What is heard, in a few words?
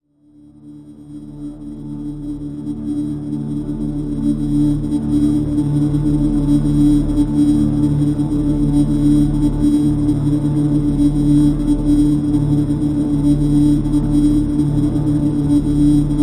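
drone; Neutrality D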